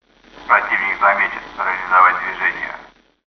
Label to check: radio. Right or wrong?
Right